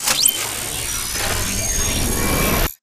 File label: spectre overload close; Titanfall 2